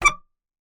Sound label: Melodica Short Note